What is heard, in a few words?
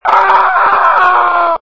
a scream; torchlight